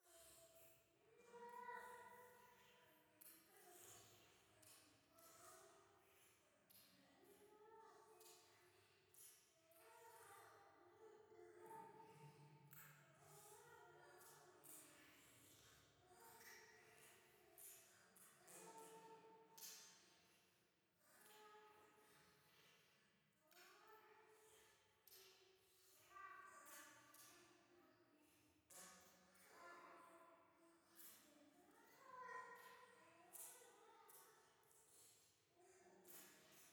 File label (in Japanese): 夢の中 イラマチオ